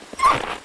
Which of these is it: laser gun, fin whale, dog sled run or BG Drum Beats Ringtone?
dog sled run